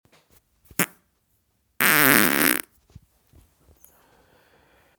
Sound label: fart